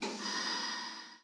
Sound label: Air, Train; OpenBVE